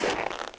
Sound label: snowball roll